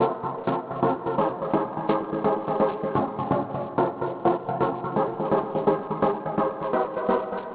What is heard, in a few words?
hihat low